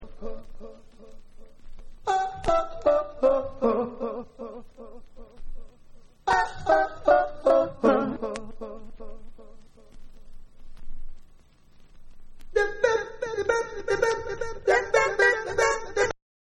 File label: Acapella Mix